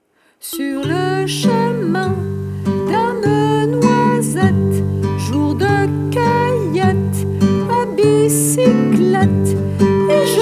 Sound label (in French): canon à deux voix; La Noisette